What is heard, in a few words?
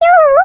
slide; Cookie Run